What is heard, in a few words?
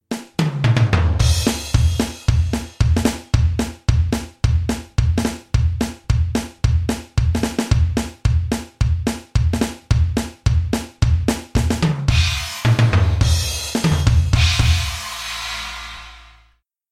drums